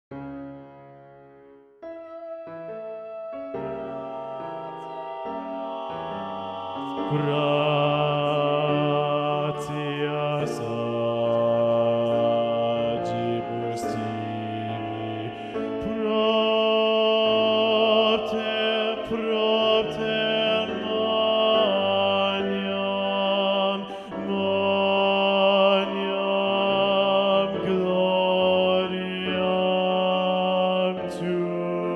Mass Gloria Gratias basso; Mozart; Mass Cmin

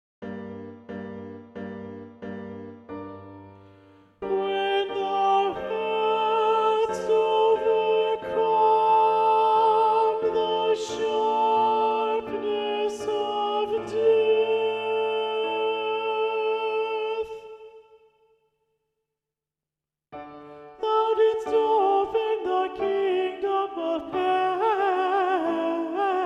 Alto; When Thou hast and Thou didst open; Handel; Te Deum

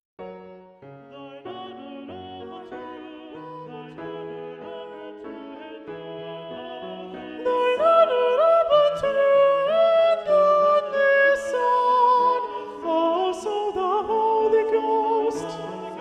Soprano; Handel; Te Deum

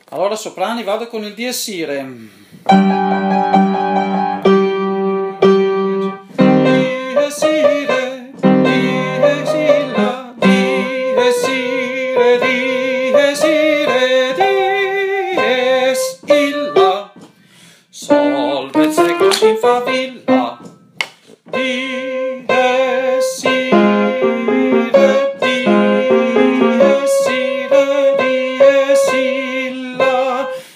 soprano; Dies Irae; requiem rossini

